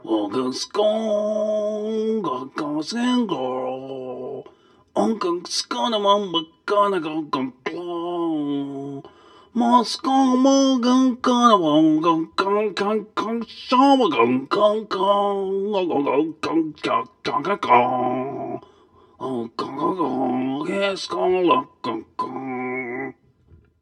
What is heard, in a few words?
Sing; Ballow